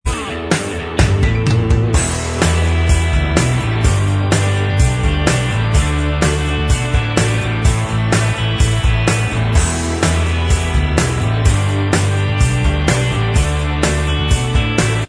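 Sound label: instrumental, backing track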